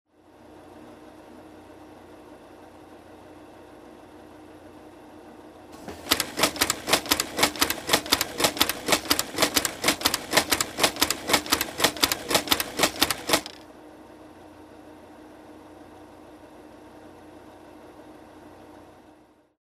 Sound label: Automatic Labelling Machine